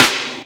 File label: Snare, Dubstep One-Shot Drums